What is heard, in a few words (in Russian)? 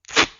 bistro otrvali skotch